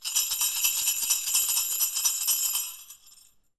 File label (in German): Rasselstab; Instrumentensammlung